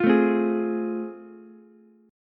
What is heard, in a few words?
A Minor Major 7th Sharp 5th, Ukulele; strummed; AmM7#5; 10,9,8,11; JGuitar